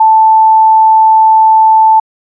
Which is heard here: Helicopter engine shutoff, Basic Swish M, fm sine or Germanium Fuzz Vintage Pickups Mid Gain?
fm sine